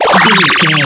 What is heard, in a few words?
retro powerup collect